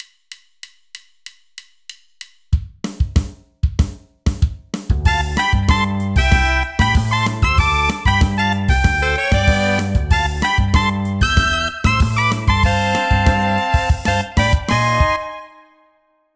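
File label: Horn Section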